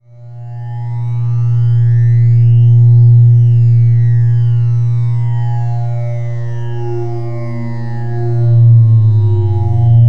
psy, ambient

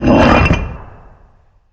move

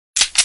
walther draw, weapons

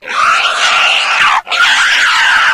flesh anomaly death, monsters

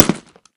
boot; ambient

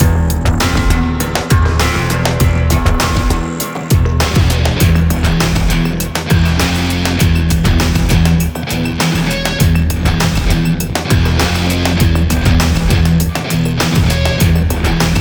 theme, music combat

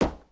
wood, human step

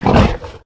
boar pain

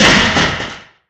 metal; ambient

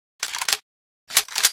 rg6 reload, weapons

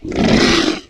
flesh pain, monsters

